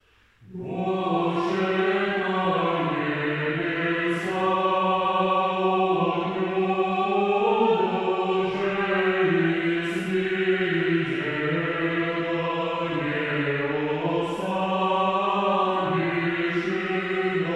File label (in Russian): Новосибирский старообрядческий молодежный хор; Восшед на небеса